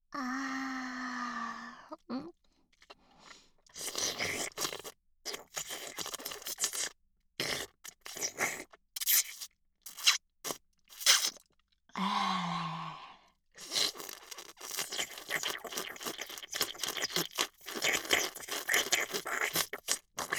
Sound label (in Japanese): フェラチオ; SEなし